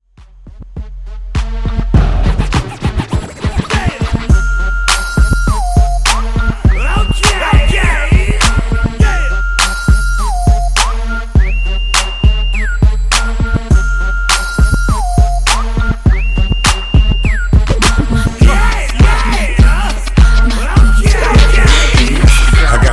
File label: Funk Transition 102 126 Bpm